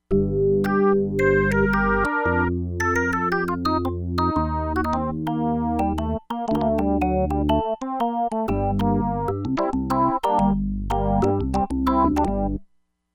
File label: JAZZ ORGAN + MALLET BELL, Crumar Bit 99; Factory Programs